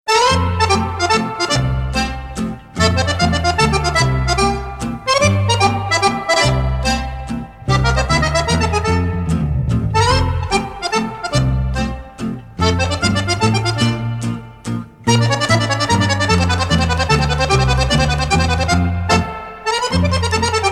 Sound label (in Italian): mazurka